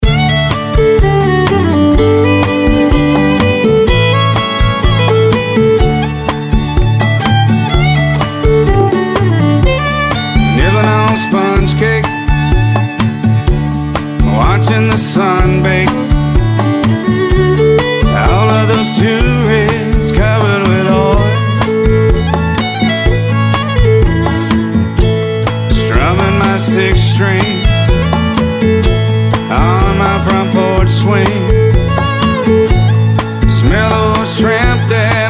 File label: SOLO FIDDLE